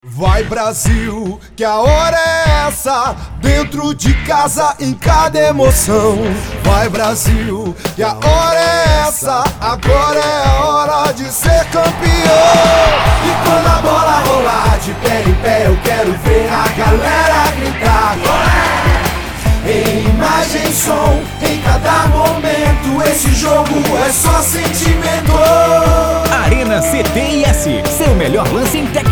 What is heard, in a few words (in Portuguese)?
JINGLE; CTIS COPA jogo